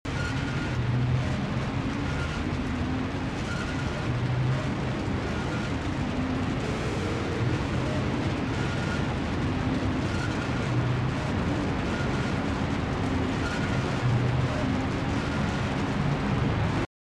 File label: Guitar; Intoxication